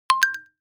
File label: check message